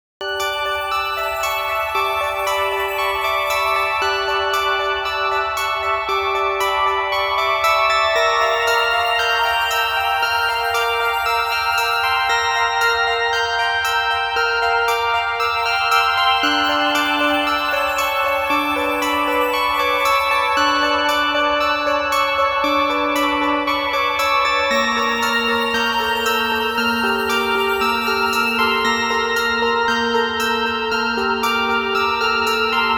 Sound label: Awoken, alarms; Motorola Moto E20; Lenovo